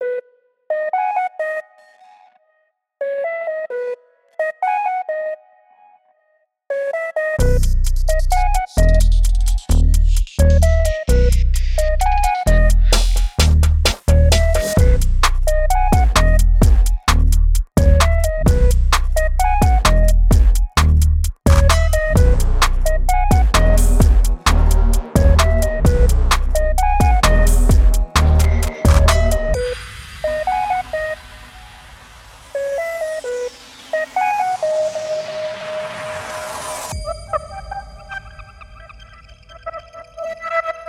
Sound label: Flimmer, chiptune beats